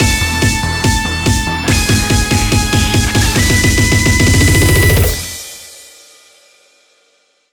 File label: EVENT (win); Initial D Arcade Stage Zero